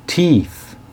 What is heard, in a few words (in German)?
tief